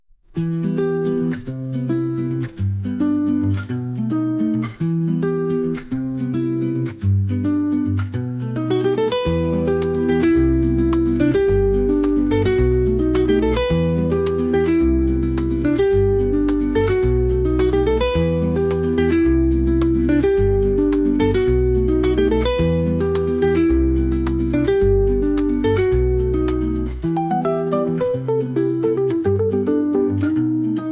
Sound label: Commercial Corporate Advertising Music; Commercial Pack 1; Premium Sounds